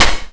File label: Noise Hit; Drums & Drones